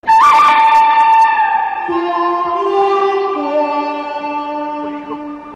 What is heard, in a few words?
Western